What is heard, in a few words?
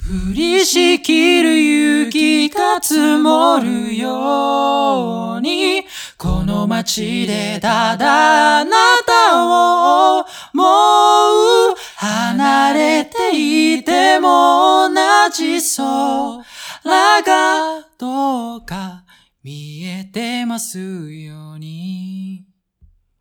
chorus; saikai